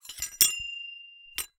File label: Hole Saw Rattle, Hole Saw Bits